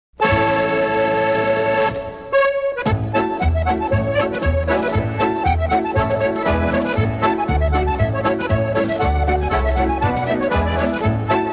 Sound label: scottish sword dance (instrumental)